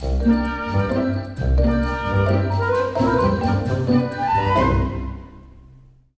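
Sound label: sting opportunity success; The Sims 3; Windows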